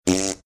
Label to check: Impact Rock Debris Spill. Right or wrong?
Wrong